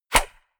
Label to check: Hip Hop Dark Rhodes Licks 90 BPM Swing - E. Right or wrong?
Wrong